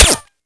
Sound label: m4a1